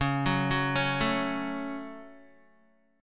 Db Suspended 4th Sharp 5th Guitar 4F,4A,5C,5G,5C,6E; arpeggiated; JGuitar